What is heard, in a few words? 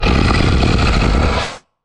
troll monster growl; Infernal Radiation